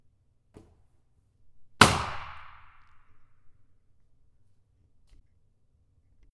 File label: pop; CMC Kravis The Cube